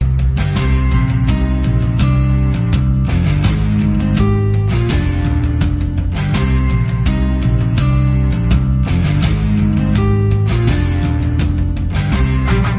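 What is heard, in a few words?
rock, ringtone; mandalorian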